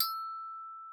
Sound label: TRIS E6, VIBES; Xsample VOL-4